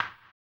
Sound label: HAMBONE; ILIO; Vocal Planet; Blues & Soul